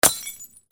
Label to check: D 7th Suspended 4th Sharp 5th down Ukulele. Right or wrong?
Wrong